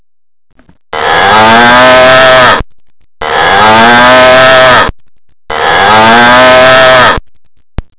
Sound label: moo